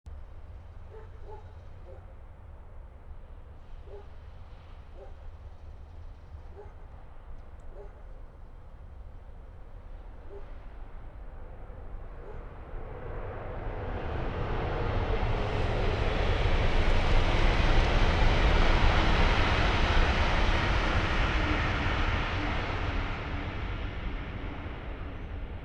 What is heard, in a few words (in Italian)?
Treno; Eigenmike; mono 16bits; Nuova Strada; ComunePR